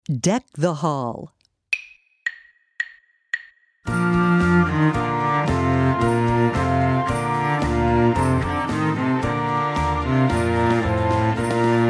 Deck the Hall

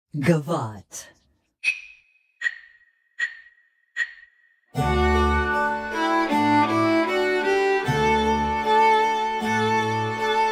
Gavotte

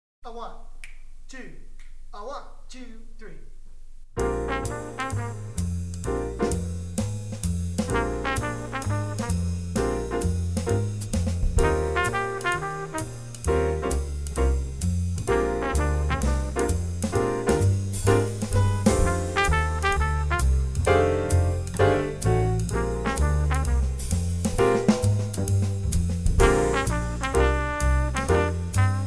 12 Bar Blues Call And Response

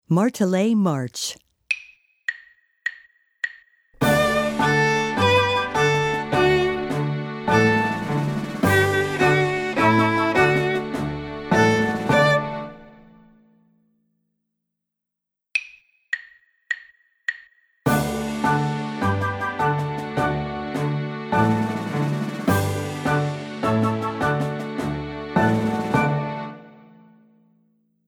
Martele March

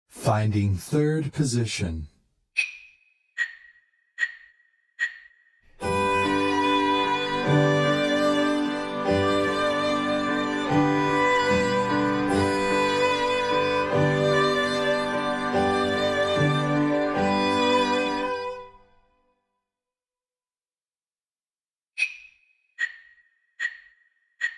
Finding 3rd Position

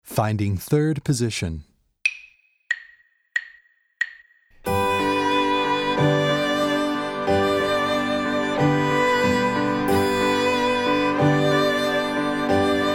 Finding 3rd Position

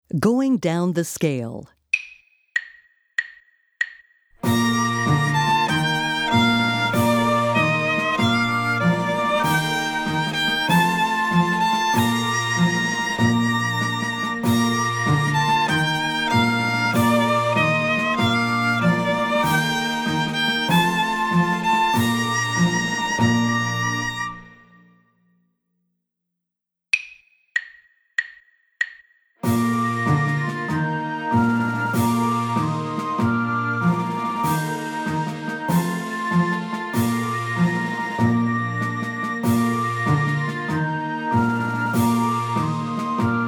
Going Down the Scale

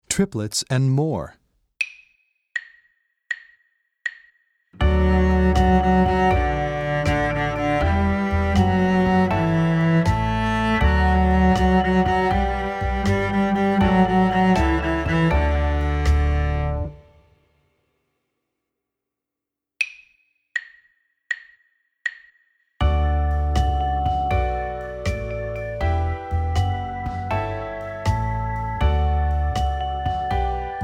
Triplets and More

